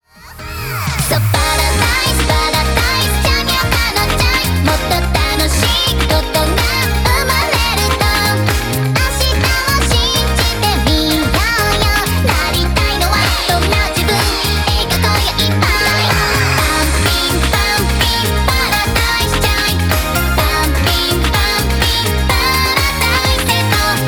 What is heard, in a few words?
Paradise Chime